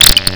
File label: ROBOT NOISE; Big Beat Samples & More; AKAI